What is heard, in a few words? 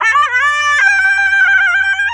Snake Charm Dry, Woodwind